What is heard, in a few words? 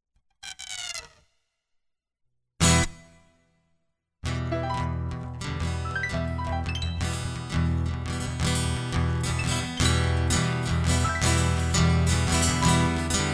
Instrumental